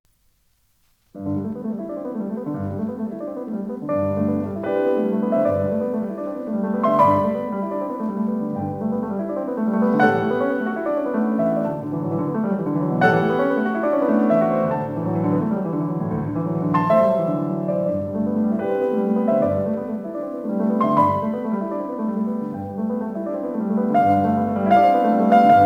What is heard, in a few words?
Preludes; Chopin; Op28; Lympany